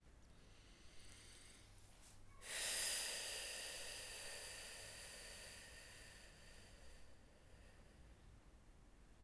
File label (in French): SOUPIR; Hadjithomas Joreige